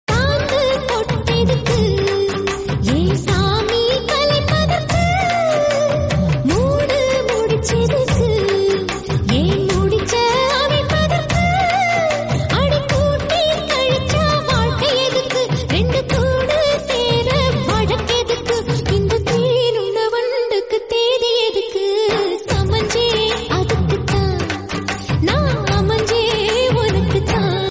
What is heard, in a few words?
Ringtone